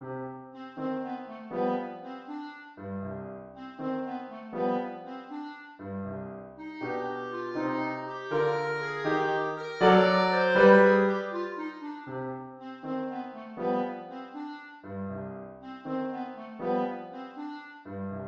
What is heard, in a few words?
march of a marionette clarinet